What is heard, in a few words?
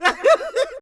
Laugh; vocals ru